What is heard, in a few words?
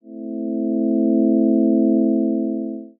synth pad